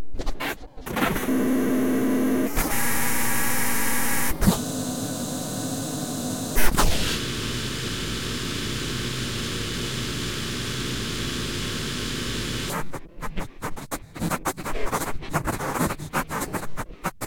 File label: pencil granular; Eric-Leonardson